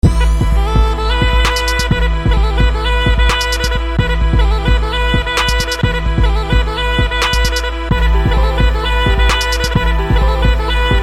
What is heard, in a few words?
Mobile Ringtone Bg Piano And Gaida